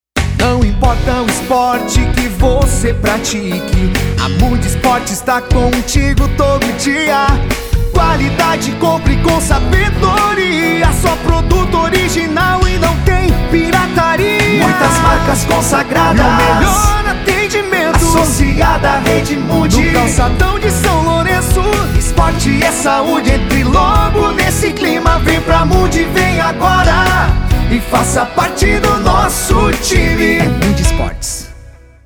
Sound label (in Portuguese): Jingle Comercial; Mundi Sports